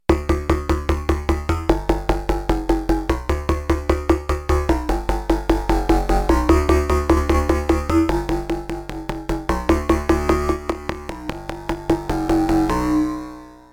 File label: synth round, access virus